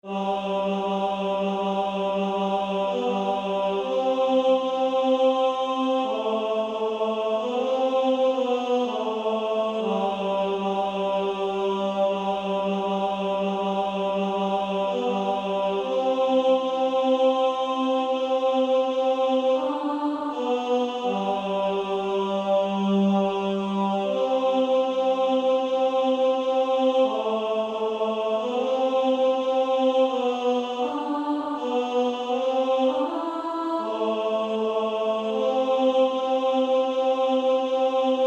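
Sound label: Female Choir, alto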